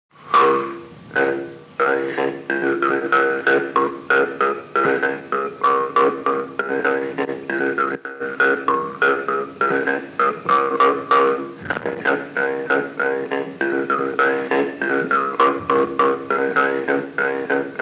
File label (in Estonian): Parmupilli polka parmupill folk; Kadrina; Johannes Rosenstrauch